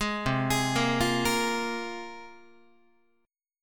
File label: C 7th Suspended 4th Sharp 5th, down, Guitar; arpeggiated; C7sus4#5; 11,8,11,8,9,9